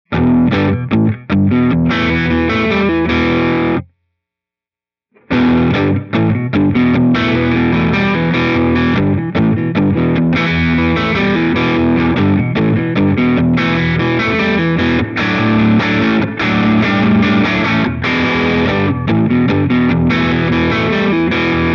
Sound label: Plate Studio Slide